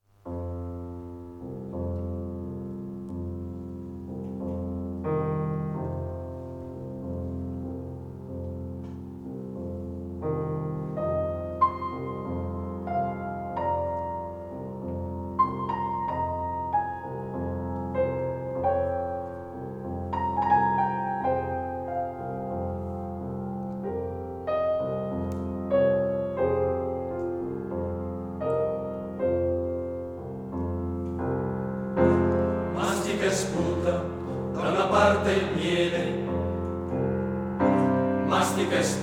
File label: male Choir